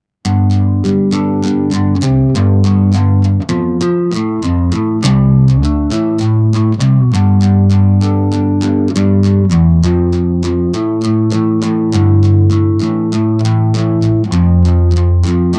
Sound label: bass guitar rok intro